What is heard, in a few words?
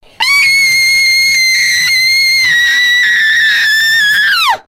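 young woman scream in pain; emotion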